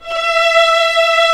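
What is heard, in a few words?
strings, 8pc, vibrato; String Master Series; Vlns 2-5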